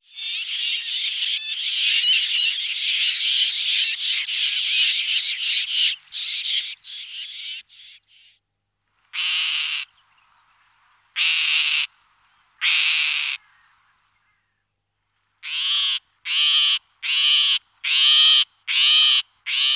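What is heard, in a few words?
seryy sorokoput golos, great grey shrike voice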